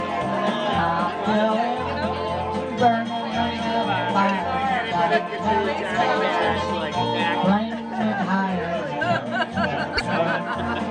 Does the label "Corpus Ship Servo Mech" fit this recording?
No